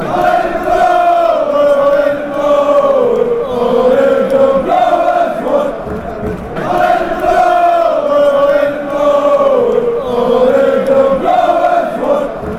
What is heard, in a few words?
club brugge chant